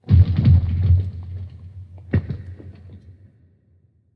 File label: Random Ice